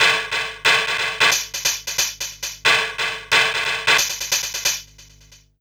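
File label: SCUM B hi-hat; Big Fish Audio; Uncivilised G'rooves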